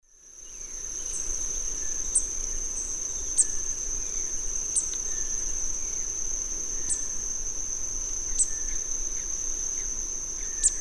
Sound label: Cyanerpes caeruleus, calls pair; S. America; Eastern Lowlands